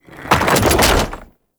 Concrete Archgun Charged React; Warframe; Archwing

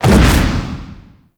Reaper Jump; Warframe; Wraith

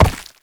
Step Ice, Movement; Uriel; Warframe

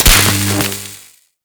Mining Laser Fire; Eidolon Plains; Eidolon Mining Lasers; Weapons

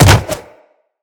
Jump Attack, Footsteps; Wall Run; Characters; Tenno

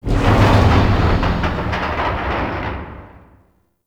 Void Dragon Move Short; Warframe; Duviri; Movement

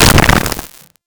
Fusion Pillar Fall; Warframe; Qorvex

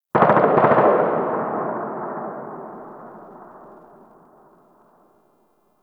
Distant Gunfire; PVP

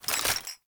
Reload Idle; weapons; Scaldra AK

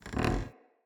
Cave Ocean Dock Creak

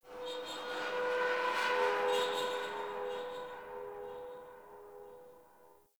Distant Metallic Groan; Warframe; Tau

